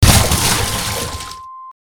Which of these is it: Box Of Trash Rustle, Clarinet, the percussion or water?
water